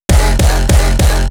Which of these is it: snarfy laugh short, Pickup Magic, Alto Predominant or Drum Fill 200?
Drum Fill 200